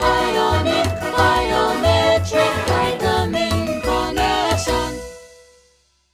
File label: radio intro